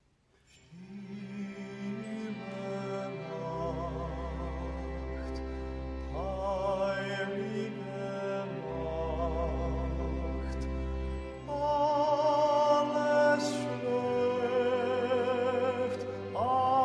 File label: hled male jezulatko; Tichá noc, přesvatá noc